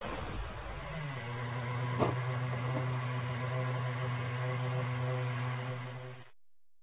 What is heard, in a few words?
ferrari